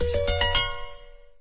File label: Acoustic